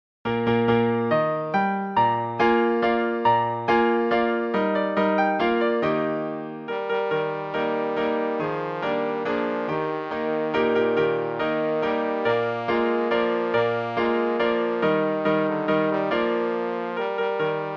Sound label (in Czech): trombon klavir; Přednesová skladba pro trombón; Two German Dances - trombón; f schubert